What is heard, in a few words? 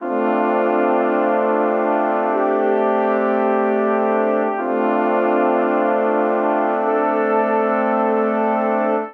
Progression ii7 - Imaj7 C Major 105 bpm, Brass Quartet; Honourable; MIDI Clips; Ableton; Spitfire Audio